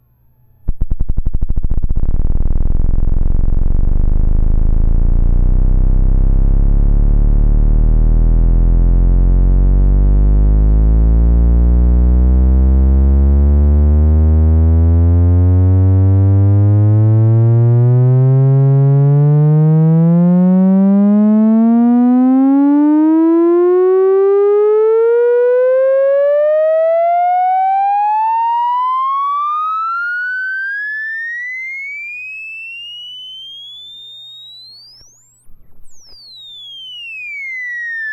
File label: theremin